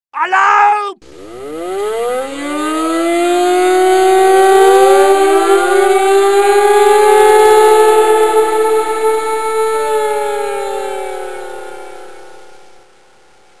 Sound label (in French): alarme, eglise